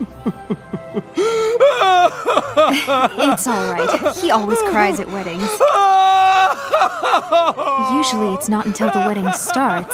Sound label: crying; shining armor